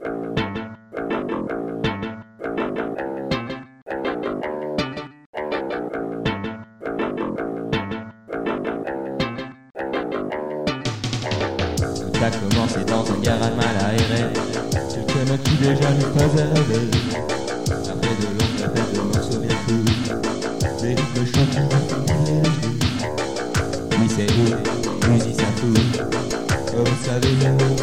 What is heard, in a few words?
REGGAE XM